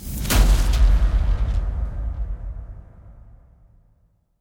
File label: Quest Failed; Custom UI Sounds; Insurgency Sandstorm